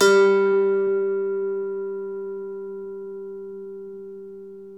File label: IRISH HARP